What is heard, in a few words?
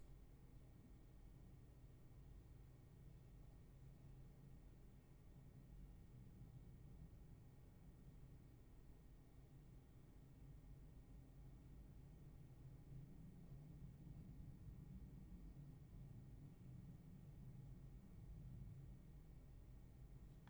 roomtone